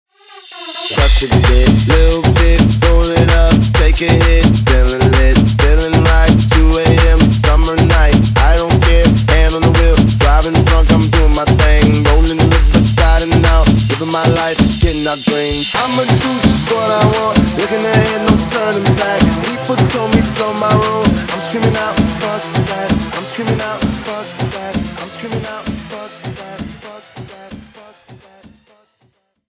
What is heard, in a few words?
intro outro 130 bpm